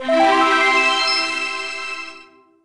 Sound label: level up; gungame